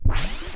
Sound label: SCRATCHES